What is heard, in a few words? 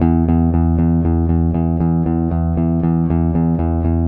Electric Bass; Basses